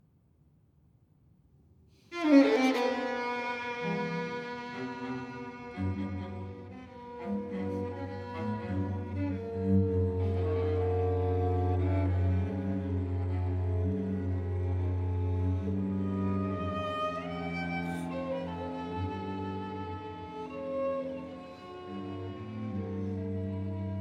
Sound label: String Quartet I. Langsam; Alban Berg; Op. 3